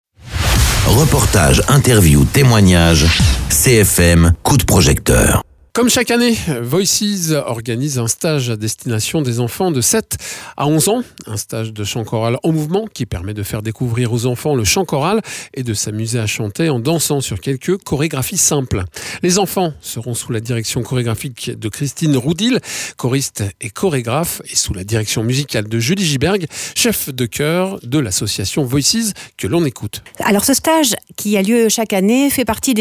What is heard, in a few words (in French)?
stage de chant chorale enfants